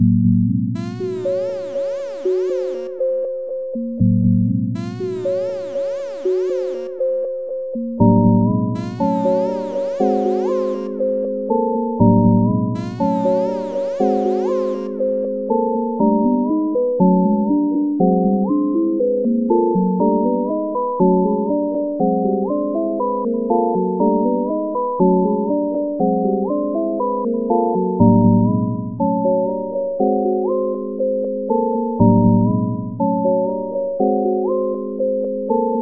eerie curious, music; stendhal

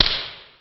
attack melee

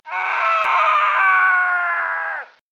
civilian male death

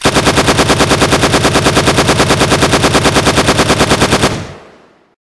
bullet chaingun; ufoai; weapons

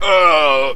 male death; ufoai; soldiers

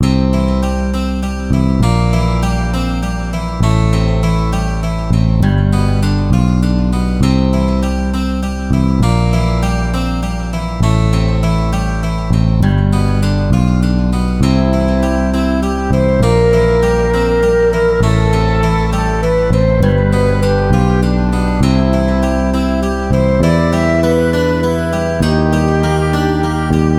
town; stendhal

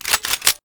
reload rifle; ufoai; weapons

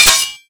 sword hit

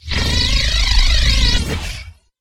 bullet needler; ufoai; weapons

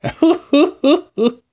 laugh old man

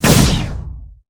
plasma large; ufoai; weapons

